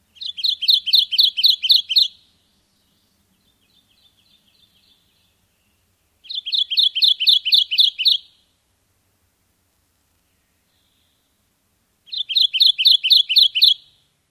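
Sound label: songs, Kentucky warbler; AK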